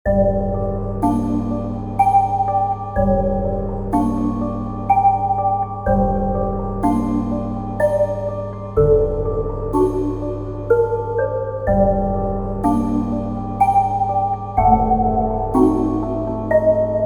Spooky House No Voice

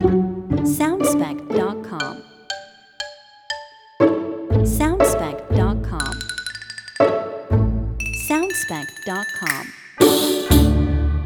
Funny

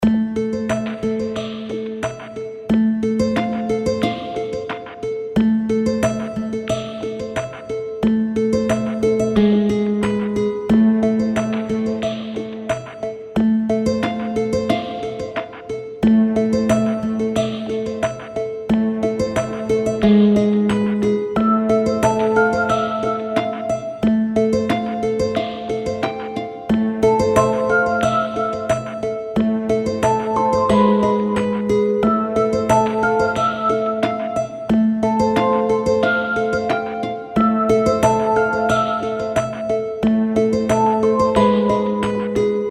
Mystical Triphop

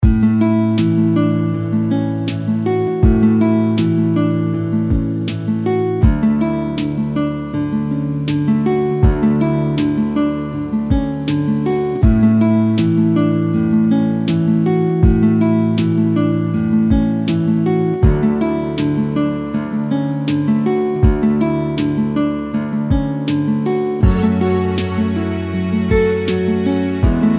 Positively Neutral Loop

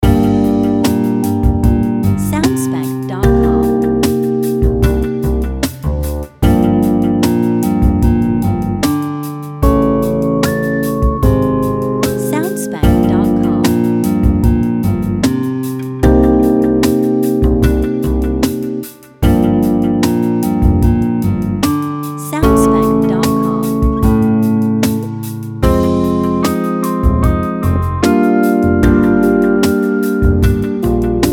City By Night Short Loop

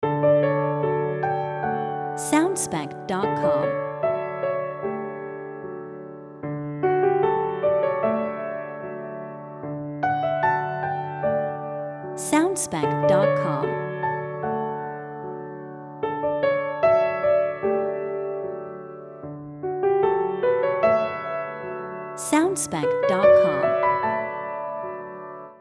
Small Romantic Moment

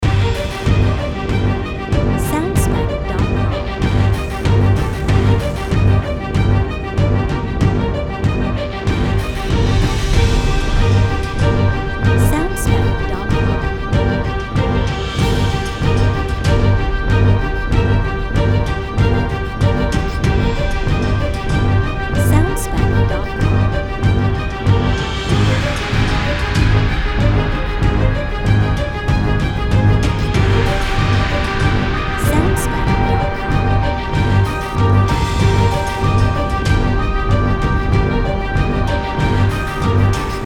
Battle With Demons Short Lite Version

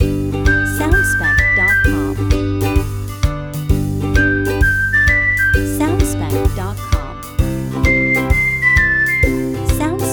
Young and Happy Short Loop With Melody Variation

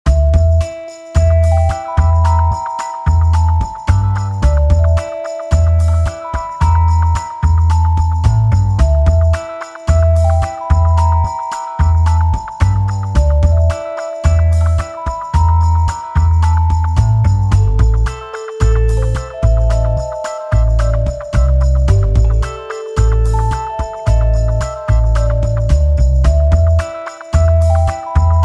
Funky Groove

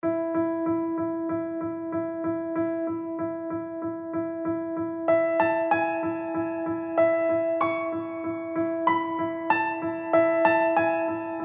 Piano Version

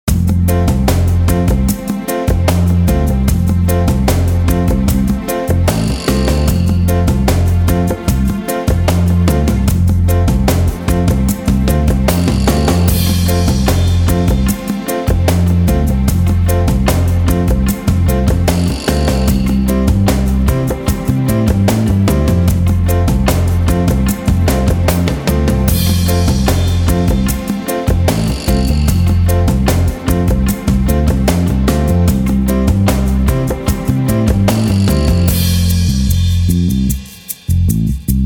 Just Reggae

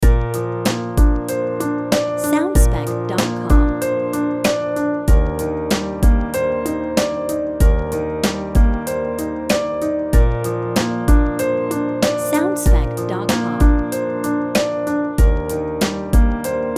Sweet Piano Loop B With Drums

